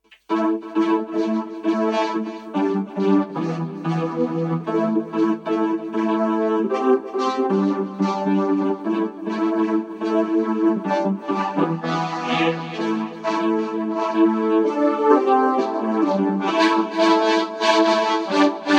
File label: vocodizer; plugiator